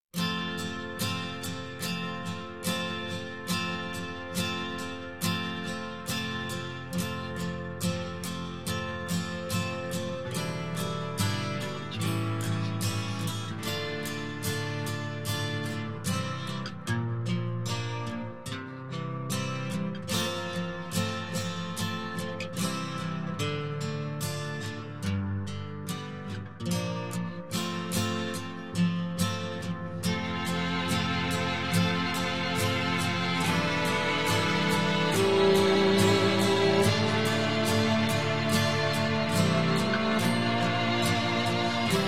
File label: brass minus